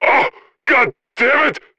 wound

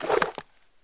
satchel radio

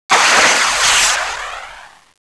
rocket fly

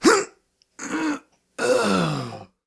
death; police

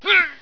trooper pain

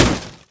hit wood

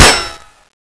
bar ricochet

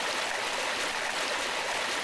waterfall; depths; ambience

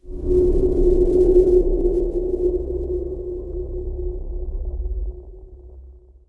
scary ambient

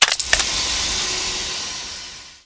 assault can out, weapons

